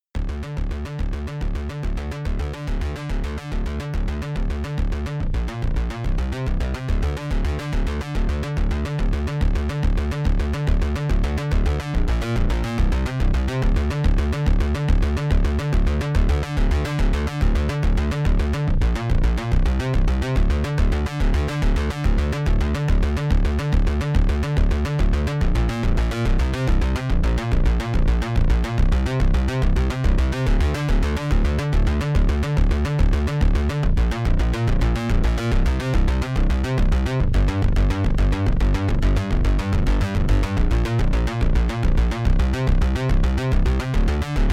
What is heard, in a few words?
synth bass